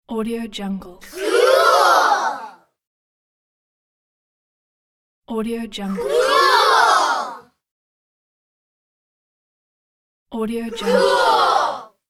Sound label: children shout "cool"